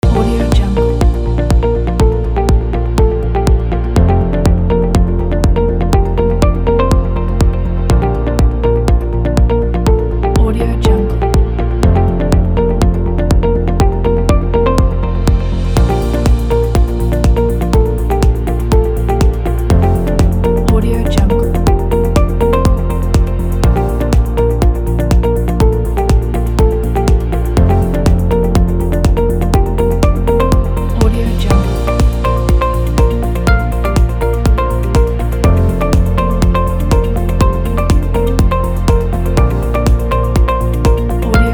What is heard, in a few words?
corporate loop